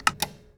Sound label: button